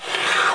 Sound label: suction